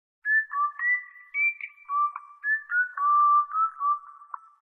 whistle isolated

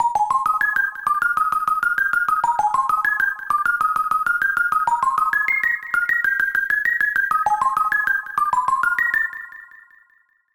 creepy jingle

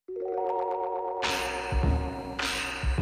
Additional Instruments

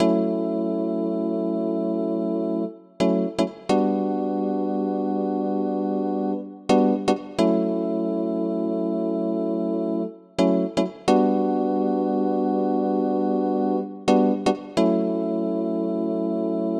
synth chords; fl soundfont player

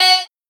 single tone

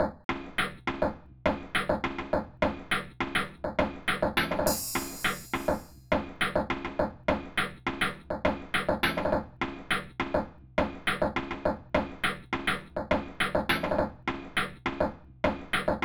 percussion; ytpmv

